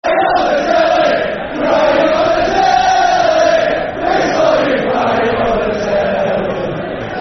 Crying On The Tele, Football Chant; Soccer Songs; fanchants; Huddersfield Town Htfc

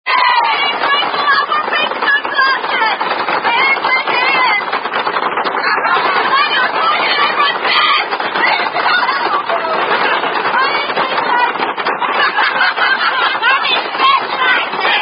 Signs Indian Drinking Game; Thumper

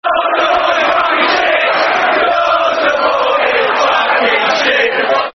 Your Support, Football Chant; West Ham WHFC

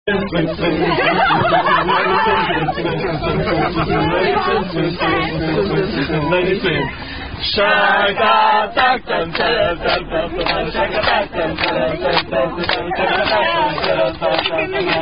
Attack Song; Wagon Ride Shark Attack 2; Baby Shark Attack Song (Variant Christian Ending)

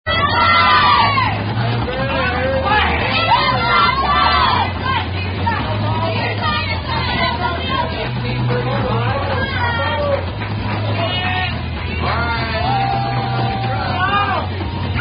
Signs Indian Drinking Game; Thumper

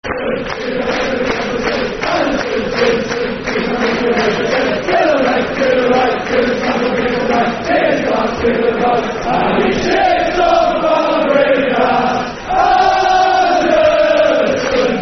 Anderson Chant, Football Chant; Man United

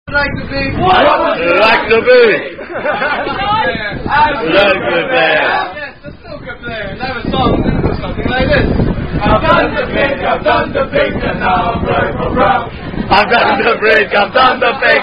Christmas Eve Singing

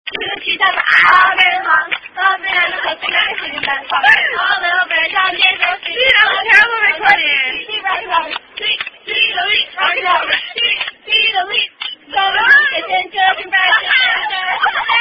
Ring Ching Ching Rockin' Robin Hand Clapping Game

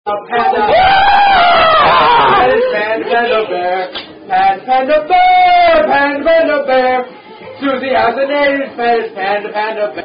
Singing Rugby Songs; Yogi Bear

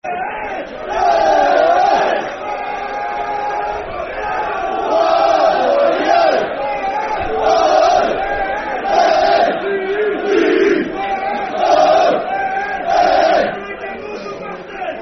S W I N D O N Football Chant; Swindon Town Stfc

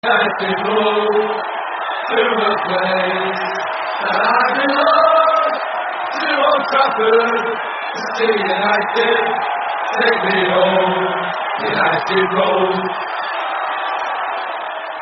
With Crowd; Man United; terrace chants

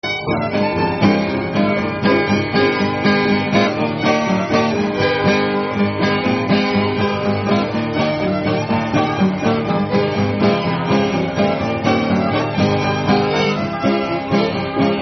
Polka

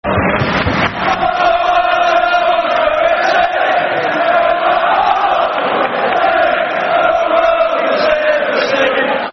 Football Chants (Soccer Song); And It's Tottenham Hotspur; Tottenham Hotspur Thfc

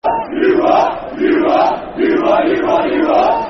You What, Football Chant (Soccer Song); Watford Wfc

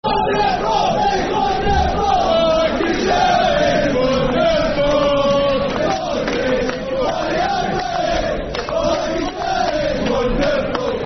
Lancashire Is Wonderful Football Chant; Bury; Bury Bfc

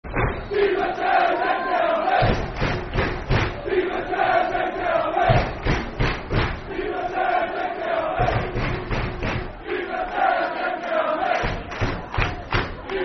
Army Football Chant (Soccer Song); Di Matteo's Mk Army; Milton Keynes Dons Mkdfc

